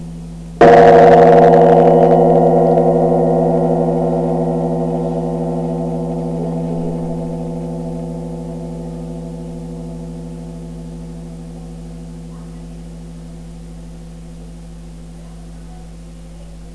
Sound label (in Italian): gong